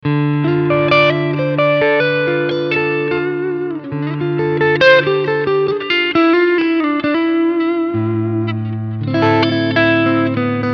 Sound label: Cleansound Front PU